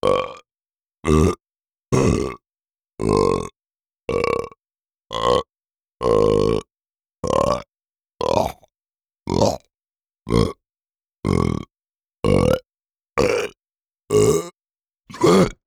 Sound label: BURP Short Strained